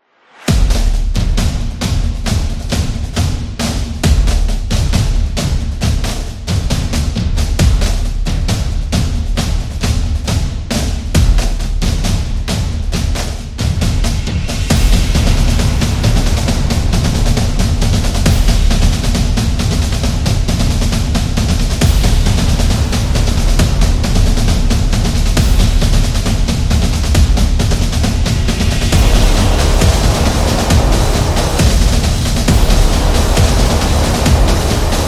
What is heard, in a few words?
Marching Band